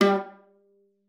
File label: G3, VIOLA PIZZ; Xsample VOL-5; 90 s Sample CDs